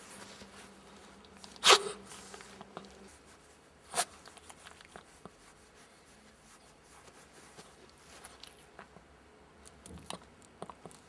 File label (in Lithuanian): kosejimas ciaudejimas ciaudo